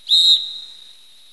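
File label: whistle start; jb engine; soccer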